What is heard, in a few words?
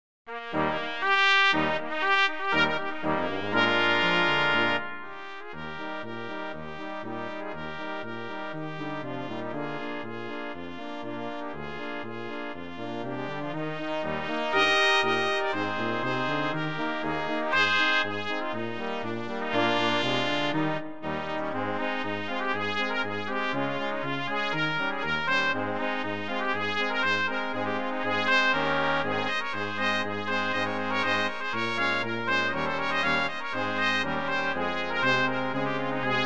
Quick March Brass Quintet; Queen's Lancashire Regiment